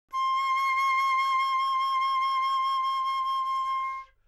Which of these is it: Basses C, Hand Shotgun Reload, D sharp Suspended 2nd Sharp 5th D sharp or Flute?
Flute